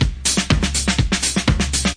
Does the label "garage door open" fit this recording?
No